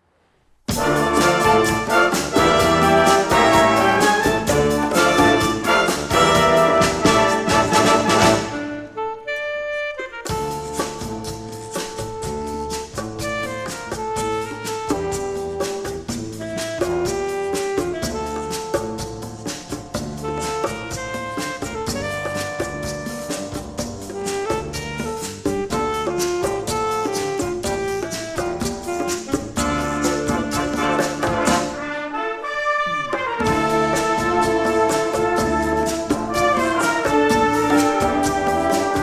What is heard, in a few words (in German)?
Orchester im Freien; Orchester aus Italien, das im Freien spielt; Mikrofone in der Nähe des Orchesters aufgestellt